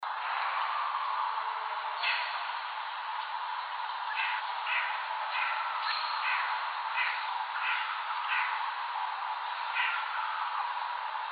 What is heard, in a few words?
White-breasted Nuthatch